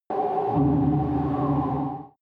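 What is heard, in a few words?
extrinsic wind, energy; energie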